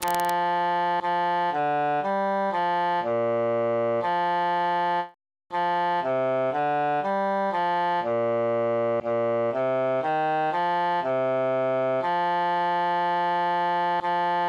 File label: bassus solo; o occhi